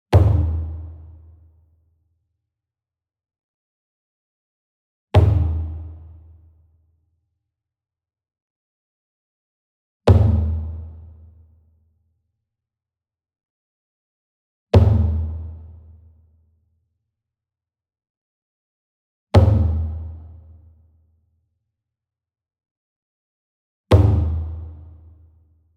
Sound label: SINGLE DRUM Kodo Hit Soft Beater Medium; boom library; Cinematic Strikes Construction Kit